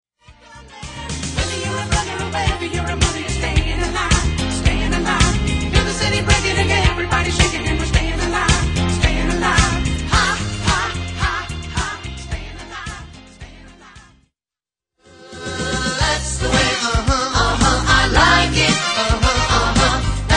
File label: disco